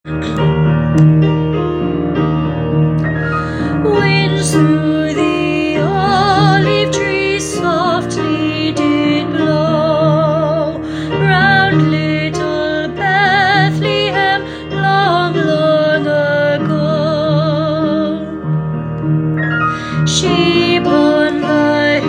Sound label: Olive trees harmony; British Science Week; connections